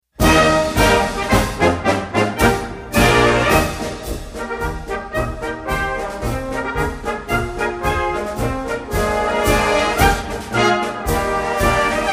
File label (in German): Marsch